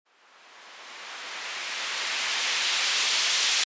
Uplifter

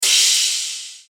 Cymbal Deep House

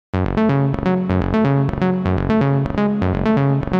Lead Melodic Techno Serum

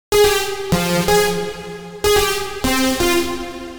Lead Melodic Techno Vital

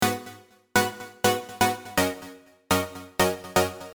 Vibes Midi Deep House; deep house bundle

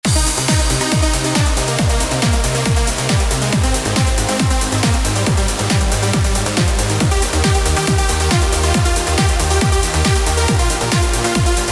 Kit trance

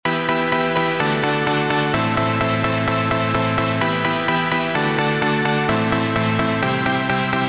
Pluck edm Hive

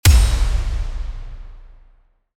Trailer percussion

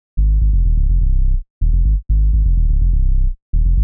Bass midi Techno House

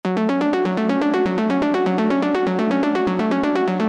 arp Loops

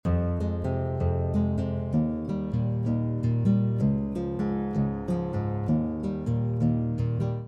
Guitar 125 Bpms E♭ Major

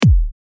Kick Psytrance

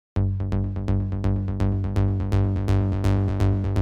Bass Melodic Techno Spire

